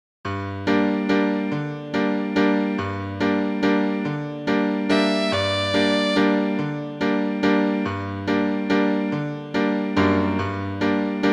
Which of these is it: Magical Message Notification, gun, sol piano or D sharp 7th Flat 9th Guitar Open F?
sol piano